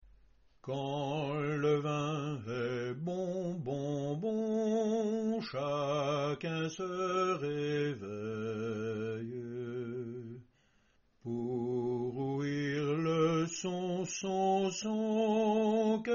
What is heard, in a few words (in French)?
basse; quand le vin est bon